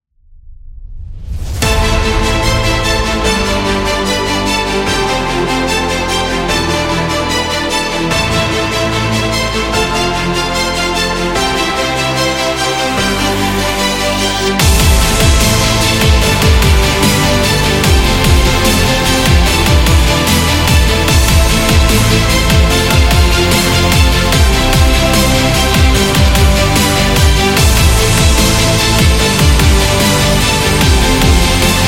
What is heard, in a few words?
Epic Dubstep